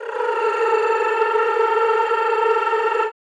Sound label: CHO A3, Rrrr; Sound & Vision; Gigapack I CD 2; CHO Mmhh + Rrrr